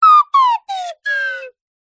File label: cartoon slide whistle double pitch descend